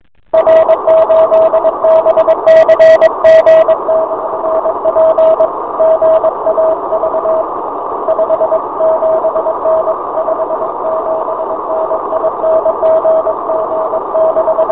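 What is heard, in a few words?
morse code amateur radio contact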